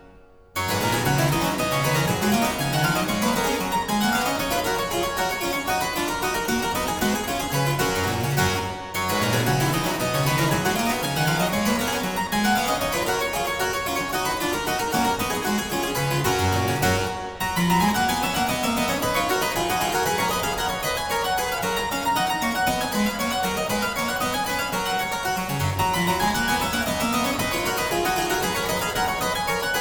Aria Variations in G Major, Harpsichord

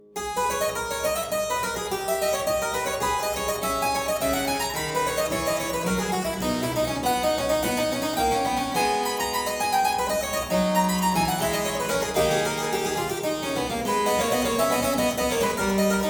Aria in A minor Variatio 2, Harpsichord; Buxtehude; Complete Harpsichord Music

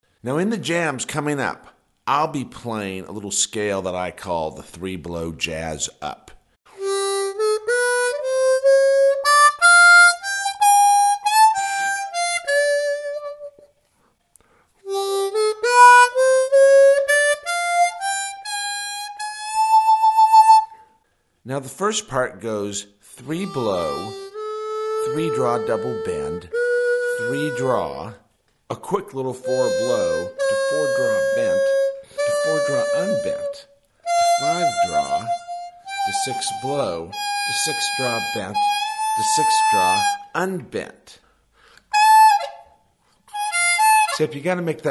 3 Blow Jazz Up Riff, harmonica

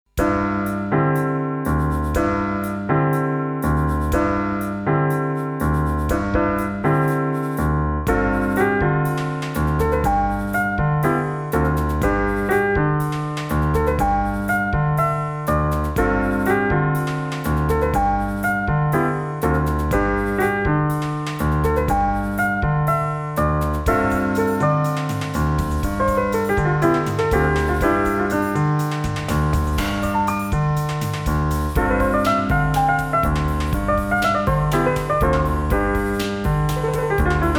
Piano Version; Noise Candy 5 - Console

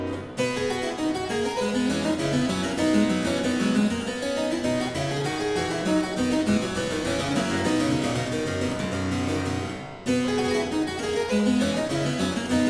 Aria Variations in C Major, Harpsichord

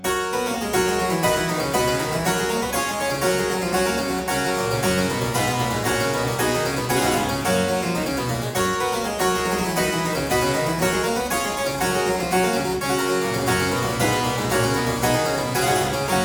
Aria in G major Partita 23, Harpsichord

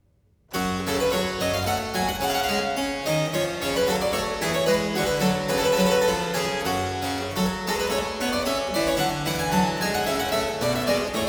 Hornpipe; Brilliant Classics; 20 keyboard pieces (ed.Davitt Moroney)

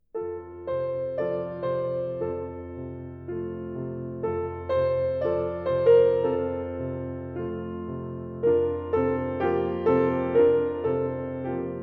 Norwegian Mountain Melodies, Lullaby, piano; Grieg; The Complete Music For Piano; 6 Norwegian Mountain Melodies, Op. 112; Baadnlaat

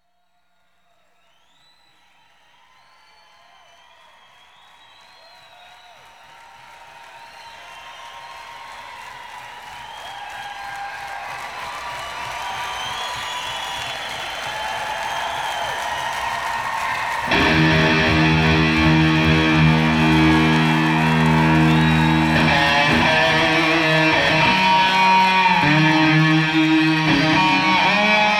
Intro Guitar Solo

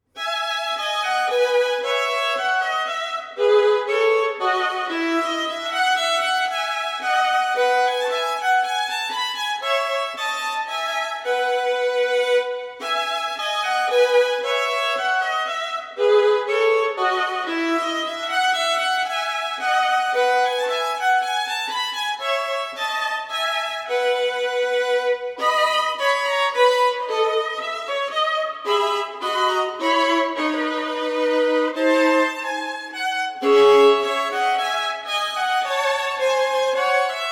Partita in E major - Menuet, Solo Violin; Baroque; J.S. Bach; Sonatas And Partitas For Solo Violin; BWV 1006

